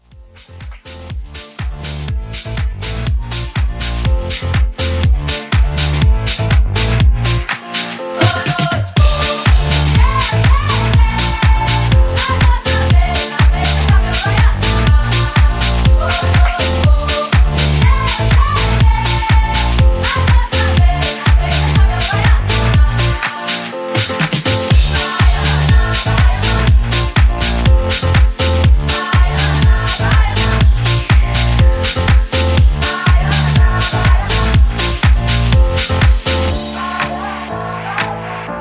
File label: Club, 122